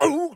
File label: Scream